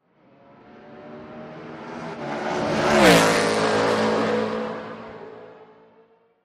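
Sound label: Sports Car Close Up By Fast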